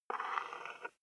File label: wood board scratch